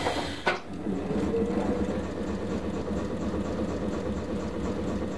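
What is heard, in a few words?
compressor start, Train; OpenBVE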